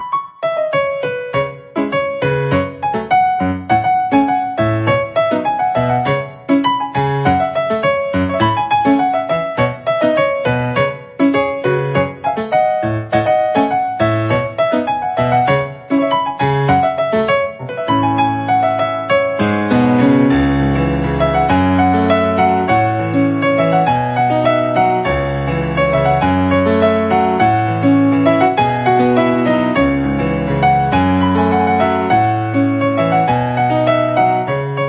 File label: iphone 11 pro ringtone